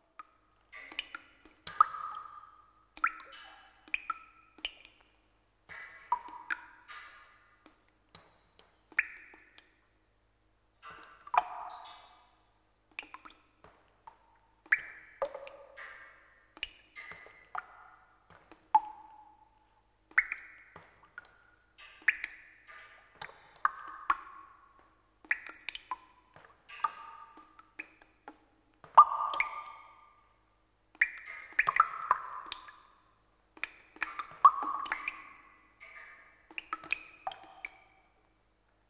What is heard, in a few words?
Water dripping in a cave